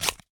Cutting Cardboard with Scissors; Office Supplies; 1001 Sound Effects

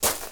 gravel; firefight

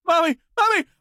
town human far; Blind Drive

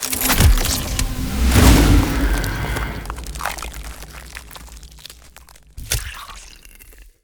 Ice Spell Transformation Shape Shifting; Arctic Aura

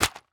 Iv Bag Set Down; Medical; Blastwave

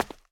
concrete; firefight

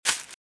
Velociraptor - Footstep - Grass - Run - Left

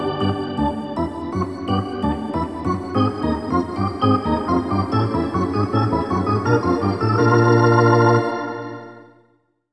Hockey Organ

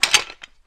Gun Ammo Pickup, Weapons Foley; SFX Kit

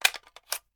Machine Gun Foley; Sound Ideas; Guns

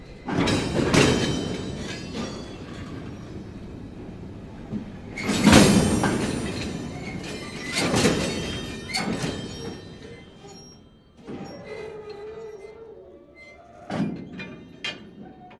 shunting track, train; best service Blue Box

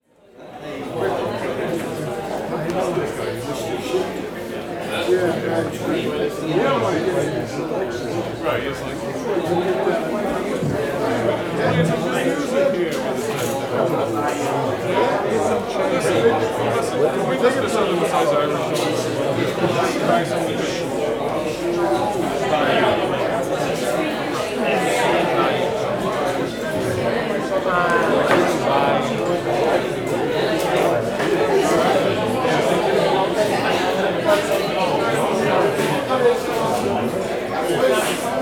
Bar Pub Busy Active Voices, Walla; Sound Ideas; Walla Series

